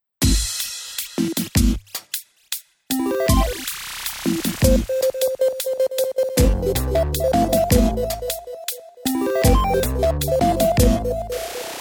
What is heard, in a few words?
video game pop